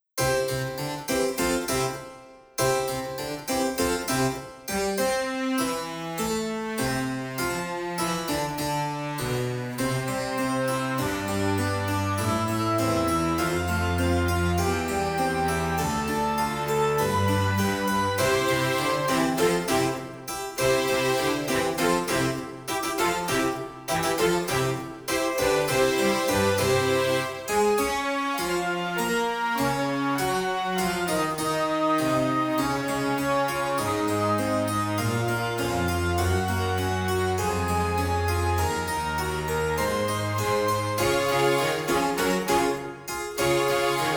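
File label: Do Re Mi Hallelujah Vocalise Accompaniment; the beatitudes preschool spring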